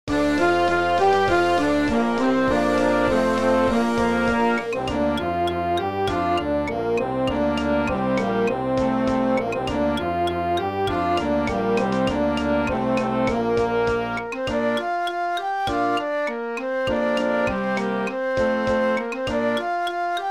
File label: Oh Susanna playback